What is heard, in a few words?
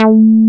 JNO TEK A4, TEK BASS; Drums In Your FACE!